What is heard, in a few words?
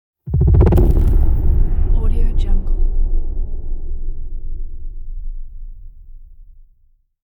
trailer impact